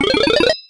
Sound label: Gold Flower Sprout, Sound effect; NSMB2